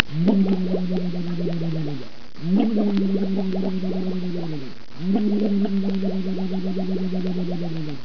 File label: moo